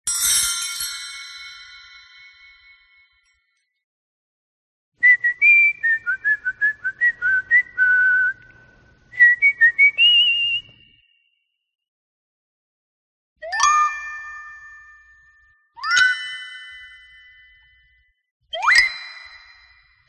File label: FINGER FLUTTERS ON TREE BELLS AH YOUTH POSTER EFFECT GONG